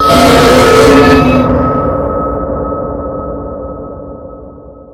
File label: jumpscare sound